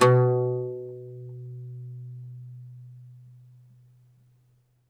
OUD B1 -R; E-MU Producer Series Vol. 7 – Old World Instruments

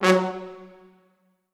F#2 L, Orchestral Brass; Best Service ProSamples vol.20; AKAI

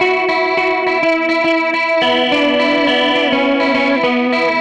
Gretsch Guitar Loop; Sonic Foundry (Sony Creative Software); Six-String Orchestra

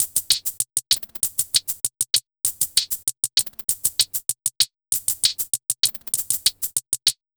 130 UK HH FX, Ragga & Dub Reggae; USB Soundscan vol.27; AKAI

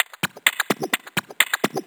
FX Loop 128 BPM, House

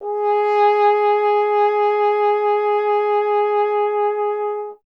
F. Horn

